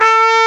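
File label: CORN LIPS D4; AKAI S-Series CD-ROM Sound Library VOL-2; CORNET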